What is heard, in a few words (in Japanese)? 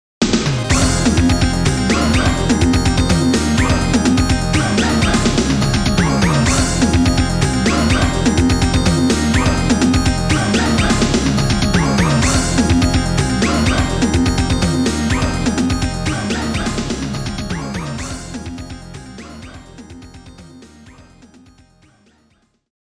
リアクション BGM; Jaleco Retro Game Music Collection